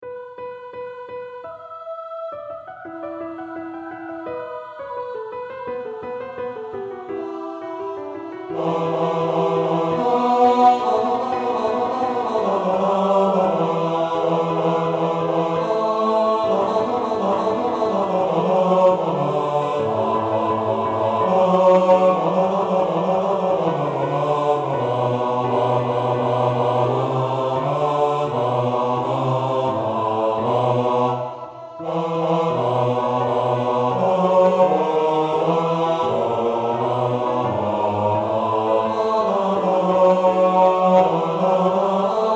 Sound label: Bass; Propter magnam